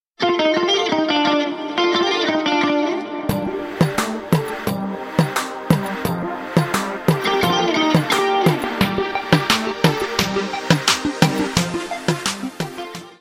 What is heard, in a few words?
arabic tune